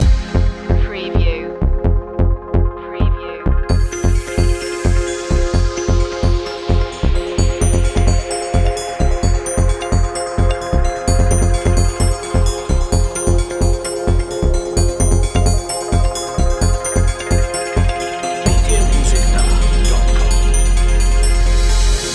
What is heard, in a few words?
futuretronics loop